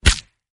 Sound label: fall; free rider hd